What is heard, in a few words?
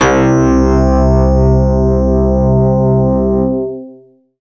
SYN DX, Roland, digital; Sound & Vision - Gigapack I CD 2